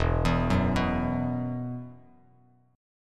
E Suspended 2nd E, down, Bass Standard; Esus2 arpeggiated

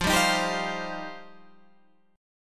F 7th Suspended 2nd F down

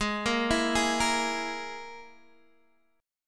Ab Suspended 2nd Sharp 5th Ab down Guitar Open D

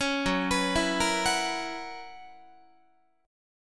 F sharp 11th F sharp Guitar; F#11; arpeggiated

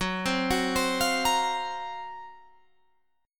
F sharp Major 7th Flat 5th

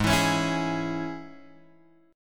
G sharp Suspended 4th Sharp 5th chord; G#sus4#5; {4 7 X 6 5 4} strummed

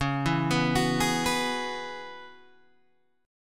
Db Minor 11th Db Guitar Open G

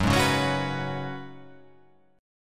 F sharp Suspended 2nd Suspended 4th; chordshape; down

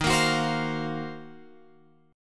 D sharp Minor 6th Add 9th; D#m6add9 Chord {11 13 X 11 13 13} strummed

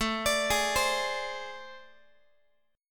A Minor Major 11th, down, Guitar; AmM11; arpeggiated; x,17,17,18,16,x

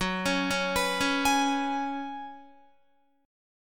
F sharp Suspended 2nd Suspended 4th, Guitar; arpeggiated; 13,16,13,16,13,16

